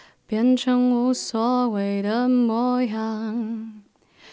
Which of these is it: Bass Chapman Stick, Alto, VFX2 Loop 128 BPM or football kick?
Alto